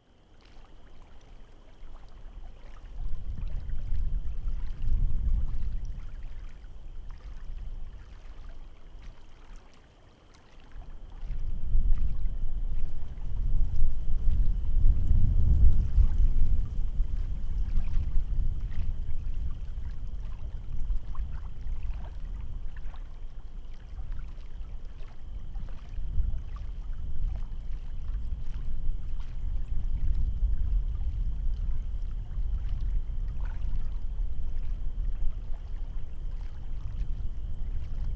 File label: Wind Lake; Wisconsin 2019